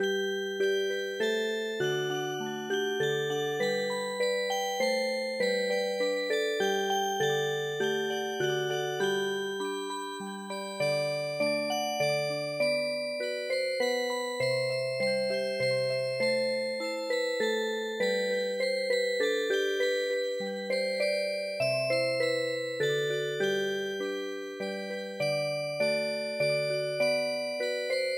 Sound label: Old Swiss national anthem Music Box